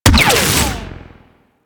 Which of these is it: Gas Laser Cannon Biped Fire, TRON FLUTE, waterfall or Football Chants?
Gas Laser Cannon Biped Fire